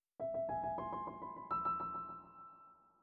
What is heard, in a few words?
Swan Lake, Piano FX